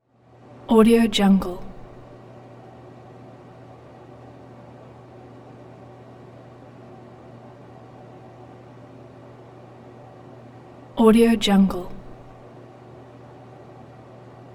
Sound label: bathroom fan